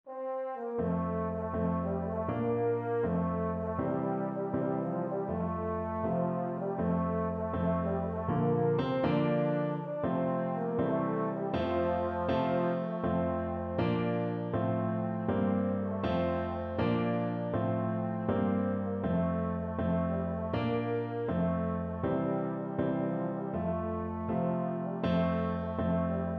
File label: EUPH, euphonium